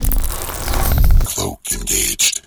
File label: camo on; garrysmod